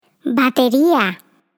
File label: DRUMS